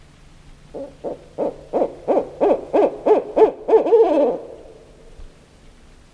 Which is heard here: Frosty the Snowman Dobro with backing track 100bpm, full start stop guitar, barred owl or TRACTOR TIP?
barred owl